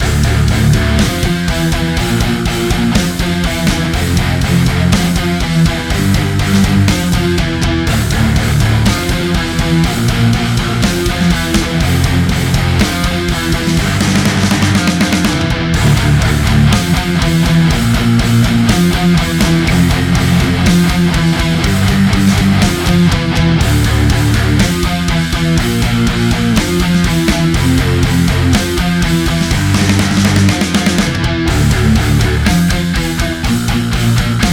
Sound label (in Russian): Тест гитар